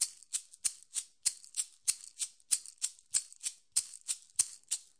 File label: simple shaker, 95 bpm; 091 to 100 bpm